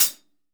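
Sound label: hi-hat closed, GM drum set; AKAI S-Series CD-ROM Sound Library VOL-8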